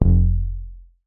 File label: bass F1, Korg MS-20